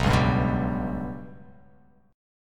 B Suspended 2nd Sharp 5th

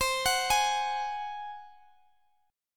G sharp 7th C

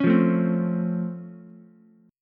Db Augmented Major 7th, down, Ukulele; strummed; JGuitar

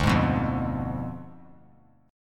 D Diminished 7th D down; strummed

